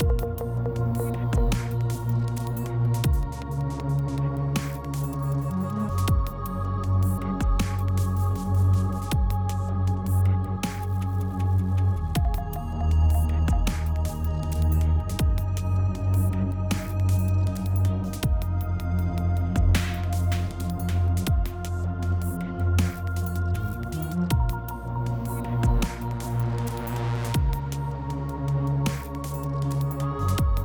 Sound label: Family Puzzle 8CH 79BPM